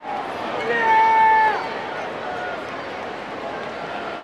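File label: baseball crowd ambience chatter; SFX Kit; Crowds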